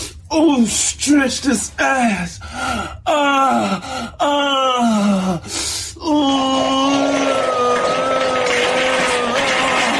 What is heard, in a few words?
fart; ambatukam